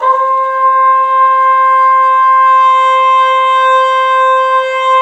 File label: BRS, TRUMPETS; Roland LA; Roland - LA Composer vol. 1